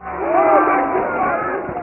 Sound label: op Goal; spin kicker